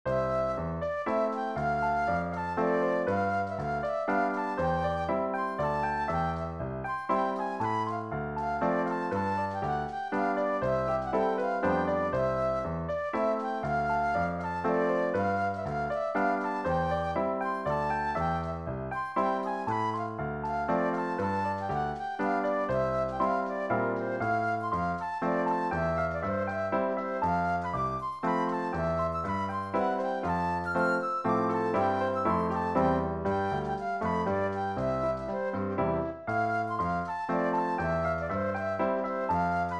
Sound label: Mazurka